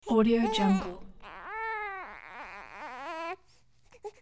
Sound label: baby cries and whines